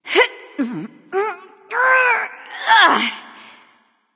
grunt lift; smite; isis

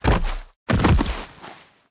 death fall; smite; merlin

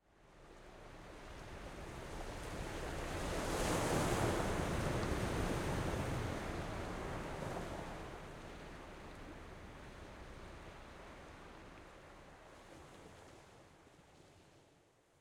defeat ocean; smite; pele

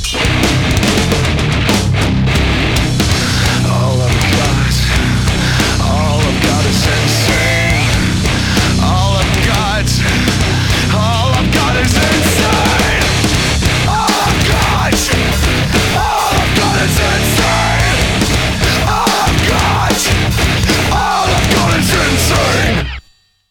mus playerkill; Smite

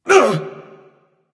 surprised; smite; voicepack; janus

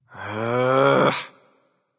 nv sigh; smite; voicepack; mercury

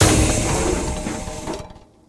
waterball roll; smite; poseidon

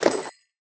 armor light; smite; chiron

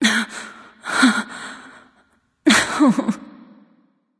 boohoo; Smite; bellona

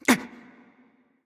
hitreact human male small

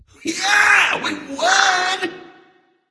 nv yay; smite; voicepack; xbalanque

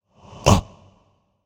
surprised; smite; voicepack; ganesha

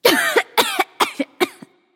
clearthroat; charybdis

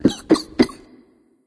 nv clear throat; smite; voicepack; ratatoskr

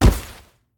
bodyfall; smite; god gilgamesh

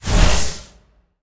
fire; Smite; Tiamat

